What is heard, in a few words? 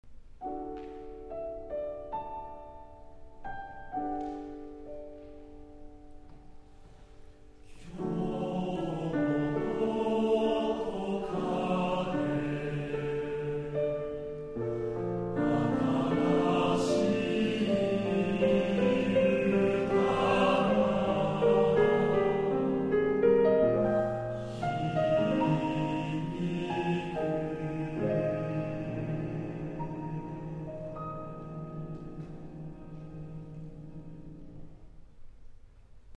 prelude, TTBB chorus male